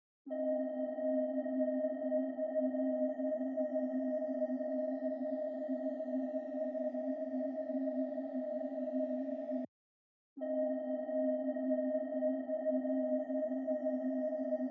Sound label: Intro - 95 BPM D# Maj Atmosphere, Hip Hop; Melody Loops; Loop Stems; Cymatics